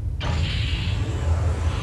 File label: Door Open; Capt Mess